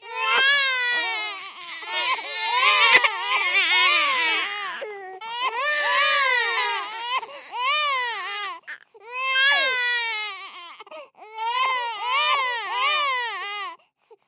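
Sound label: newborn crying sounds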